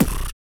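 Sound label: vox percussion, Vocal Planet Jazz FX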